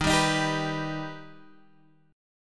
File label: Eb Augmented, Guitar Drop D; 13,10,13,12,12,x; down